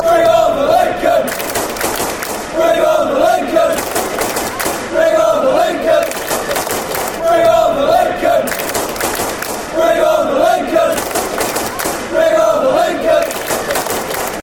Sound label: bring lincoln, fanchants